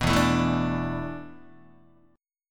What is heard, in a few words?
E 7th Suspended 4th, Guitar; E7sus4; strummed; 2,0,0,2,1,1